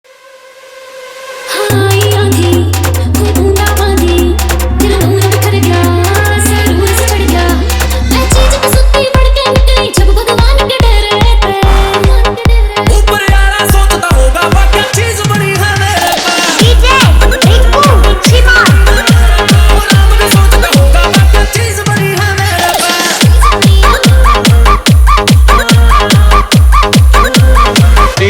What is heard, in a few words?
Remix Haryanvi Song